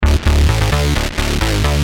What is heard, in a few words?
Bass, Industrial